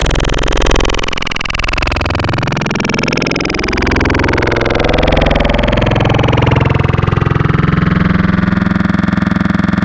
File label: Bender, Growl Tables; Cymatics; Growl Tables Vol 3; Serum